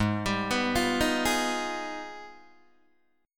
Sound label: Ab Minor Major 13th, down, Guitar, DADGAD